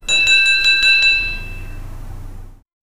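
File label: slow and clear hotel call bell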